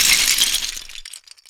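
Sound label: debris large; LEGO Star Wars Force Awakens; Junkbot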